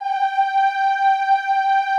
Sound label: EEH G4, Classical Choir; Best Service; Extended Classical Choir